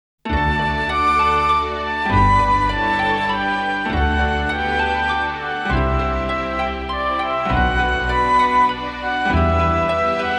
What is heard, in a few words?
Ending; bomberman selection 98; Golem Bomber